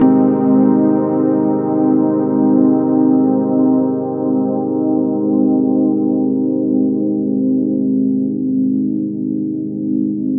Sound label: composite, ethereal atmosphere; Soundscan - Ethereal Atmospheres